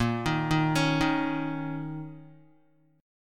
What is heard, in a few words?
Bb Minor Double Flat 5th Bb, Guitar; Bbmbb5 chord; arpeggiated; 5,6,3,6,3,x; JGuitar